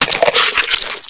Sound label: slimer hit; dn3d r